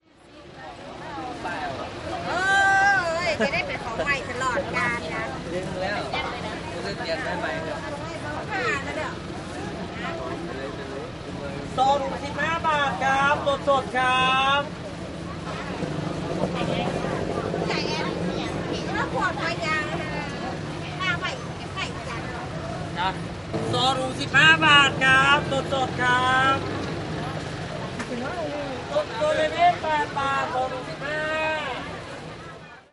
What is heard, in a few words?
fruit seller